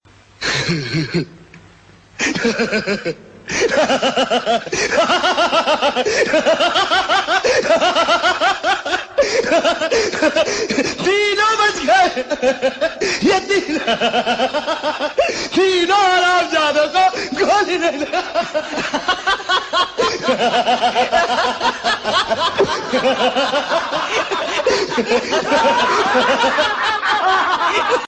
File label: laughing; gabbar singh